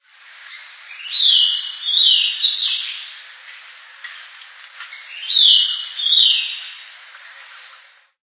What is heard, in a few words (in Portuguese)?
estalador do norte